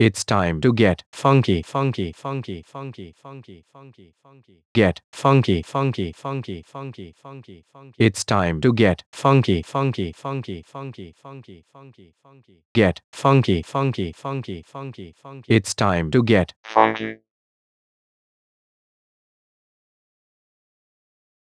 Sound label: Funky Breaks